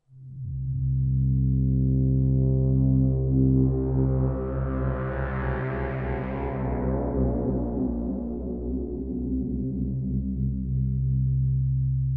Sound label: Swirling Stereo